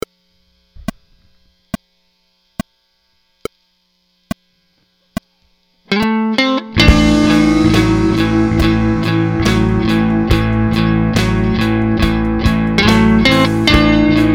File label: 12 bar blues in a